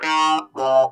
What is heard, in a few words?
Funk Guitar; 90 s Sample CDs; Zero G